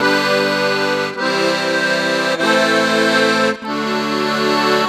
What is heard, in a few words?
Accord 098bpm G, Accordian; Quazibeat - African Secret